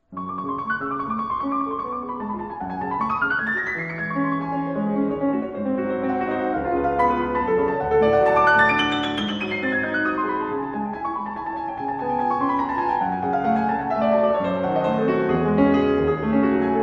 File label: Classical; For Reading